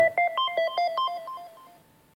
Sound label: Volkswagen Tune